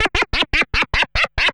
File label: LAUGH, Anime, COMIC; Sound FX; AKAI Anime Sound FX